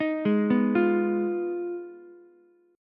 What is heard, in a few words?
B 6th Flat 5th B down Ukulele; arpeggiated